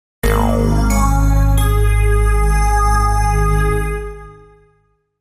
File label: Simple Logo